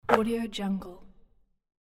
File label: basketball net